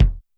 BD Dry Soft; YAMAHA RM1X

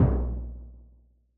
Yamaha DJX 2B, Surdo Open

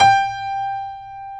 G4 piano; AKAI S-Series CD-ROM Sound Library VOL-8

